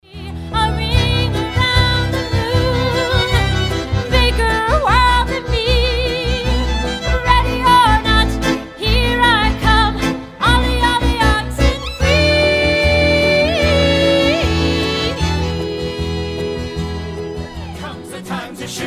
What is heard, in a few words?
Music Mashup; Spitfire